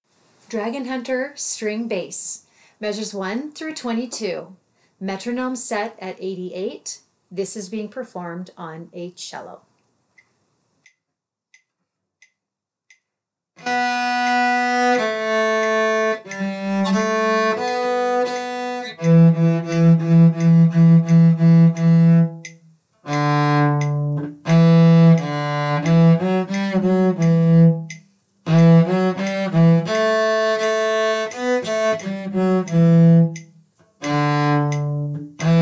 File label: string bass; dragonhunter